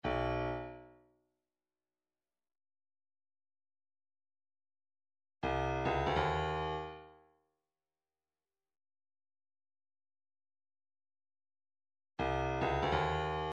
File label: separated elements talking drum